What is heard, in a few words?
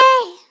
vocal "hey" C3; Evolution Of Sound; Tropical Revolution